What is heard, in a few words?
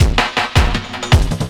LOOP L, Drum & Bass 160 BPM